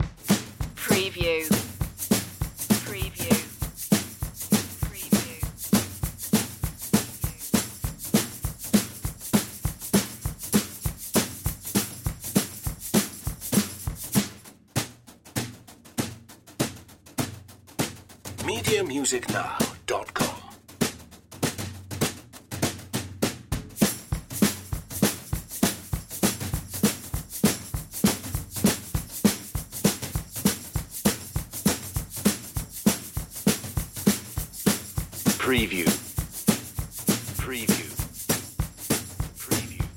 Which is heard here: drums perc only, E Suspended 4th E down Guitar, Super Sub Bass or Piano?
drums perc only